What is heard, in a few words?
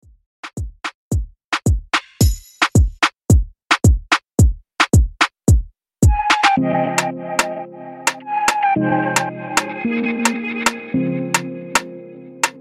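Afro Reggaeton 110 Bpm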